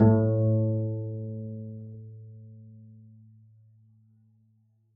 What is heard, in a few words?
pizz a2, double bass; dsmolken